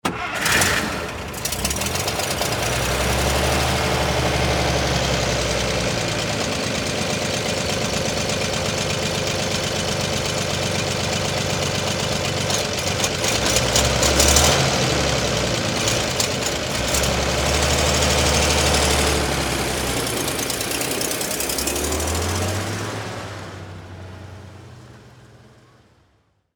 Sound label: Truck Start Idle Drive Off Automobile Military World War Ii; Vehicle